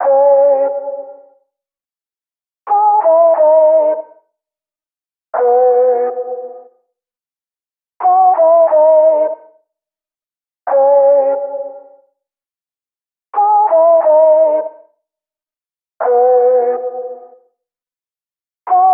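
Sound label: Mellow, vocal chop, 90 bpm, C#m; Slate Digital Omnivox Vocal Sample Pack; Chops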